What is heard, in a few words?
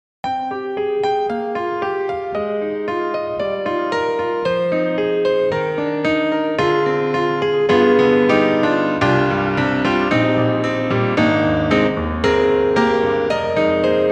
Grand Rhapsody Piano, Stereorama; Waves